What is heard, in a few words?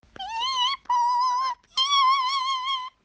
Birb impression